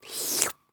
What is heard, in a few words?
Sex Noises Slurp; Hilzartov; Fairy Size Doesn't Matter